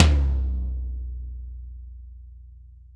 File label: TOM-TOM-L, ACOUSTIC KIT; Greytsounds VOL-1